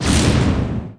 Dark shell

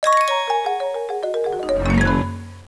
Fudge fill; Ice Bin; Fisher Price Ready for School Kindergarten

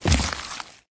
Footsteps; unreal development kit

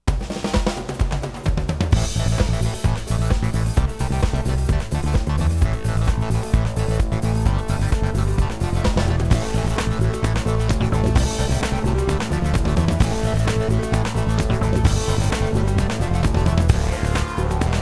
Techno; Backyard Hockey

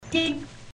Car Horn Sound

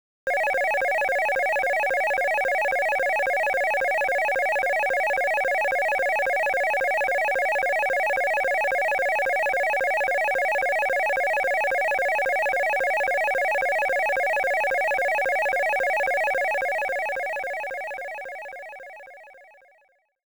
Alert Formation Attack

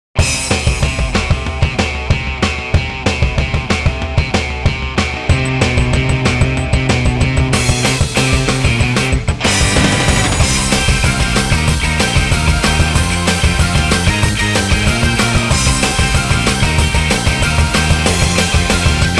instrumental; Chou Jiku Bakuren Monogatari; Door Pi Chu

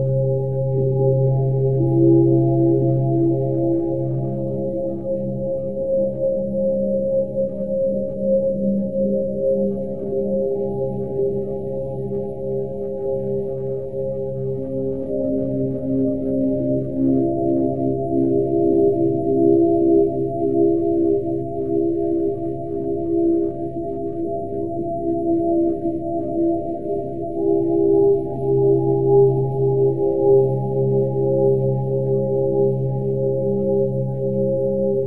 Mystical Windchimes

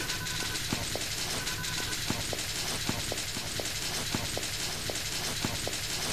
office noise; Sabotain Break the Rules

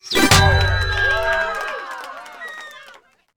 Jingle, Bronze Cup; rayman legends the complete soundtrack gamerip 2013

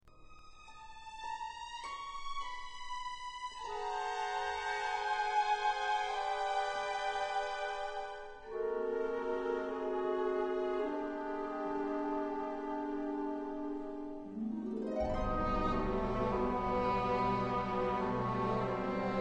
Music Prox Low

